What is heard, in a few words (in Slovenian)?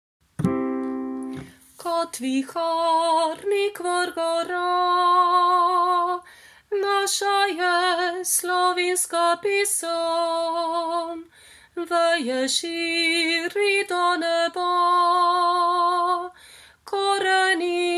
Viharnik 3. glas 1. kitica